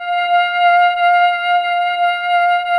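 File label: touch strings F2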